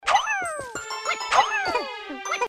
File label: throwing; gamesoundopedia